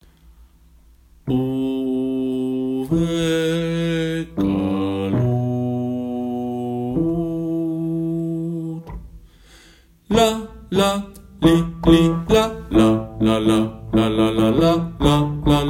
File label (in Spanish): Bajos